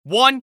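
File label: scout taunt rps exert, male voice; taunts; tf2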